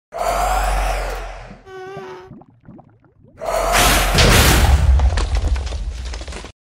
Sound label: Minecraft Wither